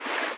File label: fast water, river sounds